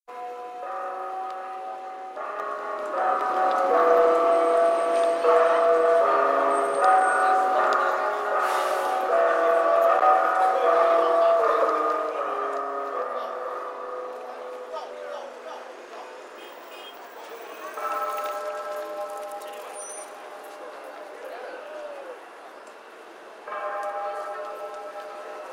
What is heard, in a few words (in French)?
Chine Beijing Sonnerie de la gare